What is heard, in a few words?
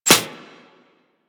Arrow Impact Metal; Warframe; Weapons